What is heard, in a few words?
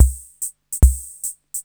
TR-66 SWING; Crypton - DrumBase5000